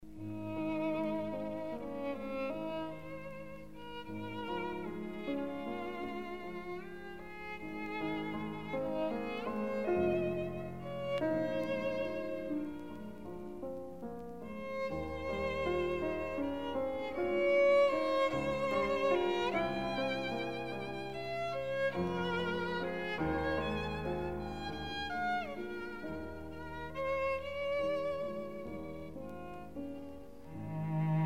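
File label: piano quintet; goetz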